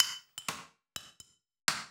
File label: bottle, ethno, 125